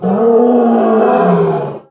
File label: BLOODCAT DYING